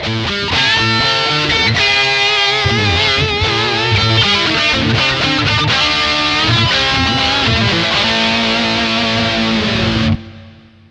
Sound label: mini dist bridge, gibson pick